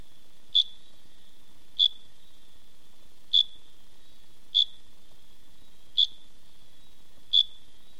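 sverchok korotkii myagkii priglushennii, cricket short soft muffled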